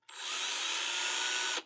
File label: drill fast short; SoundsCrate